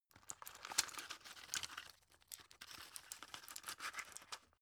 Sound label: drug put in shaky; berufe; arzt